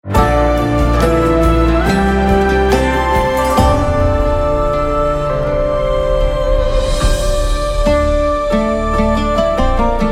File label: Sonic Branding Ringtone; Sharjah Airport Sonic Branding Ringtone